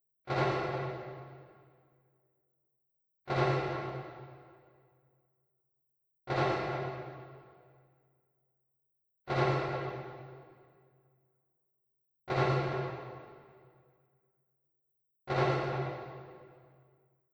Zion Snare slap; Zion2 Snareslapr

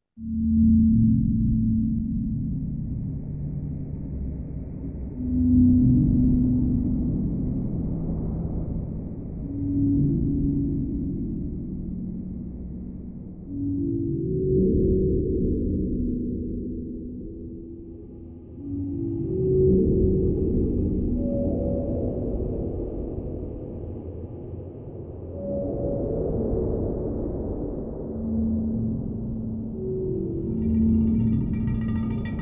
Amb Outdoor

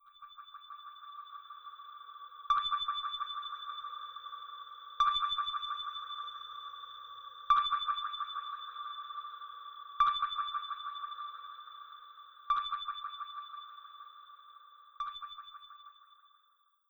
Space Ping

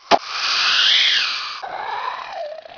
Death Snake; Miner Max

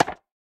Racket Weapon

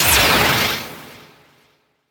JINGLE battle; beyblade x xone

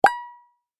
Mine; Google Snake; Minesweeper

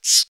Leech

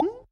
Slime Vox Small; Going Under

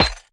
Bounce Metal

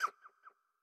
Bat Hurt

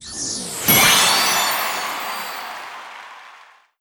Mission Complete

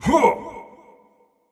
Effort Short; Marv

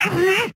Lunge; Joblin Freelancer

